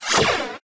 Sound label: hoverpack start; There; ThereClient